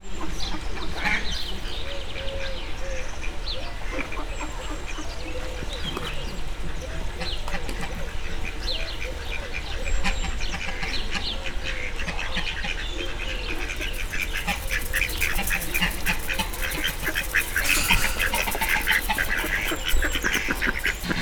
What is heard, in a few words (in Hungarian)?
csendes délelőtt, tőkés réce; allathangok; veszpremizoo 2013; akg